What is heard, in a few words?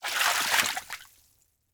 Liquid Water Water Foot Brushing Top Of Water